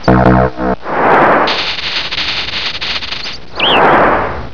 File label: rain